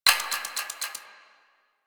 Hack Minigame UI Time Tick B

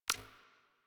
UI Corpus Theme Select; DE; Warframe

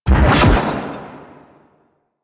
Pulse Cast; DE; Warframe; Warframes; Gyre

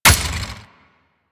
Arch Dual Pistol Weapon Hit Mech; Weapons; Warframe; Archwing

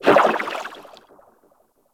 Ocean Poison Plant Pulse; Ambience; Warframe; Grineer Ocean

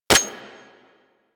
Redux Fire Mech, Weapons; Lato Vandal

